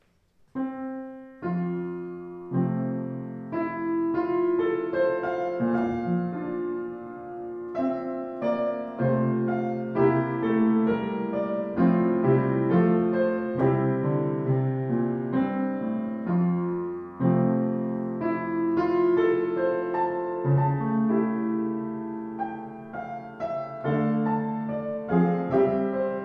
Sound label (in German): Sauter, klavier; 118 sauter r2 rr schwarz